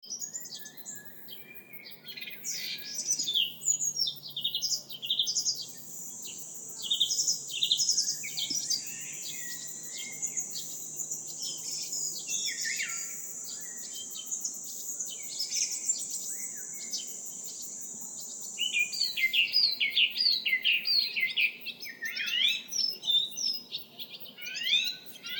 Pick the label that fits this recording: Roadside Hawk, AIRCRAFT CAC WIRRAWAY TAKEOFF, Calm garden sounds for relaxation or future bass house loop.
Calm garden sounds for relaxation